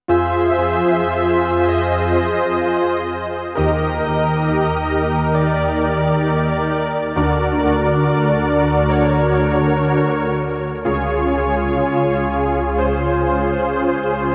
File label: pad analog, waldorf blofeld